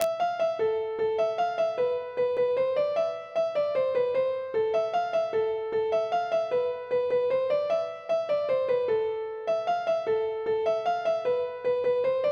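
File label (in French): mazurka; boeuf trad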